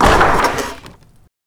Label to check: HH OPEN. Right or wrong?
Wrong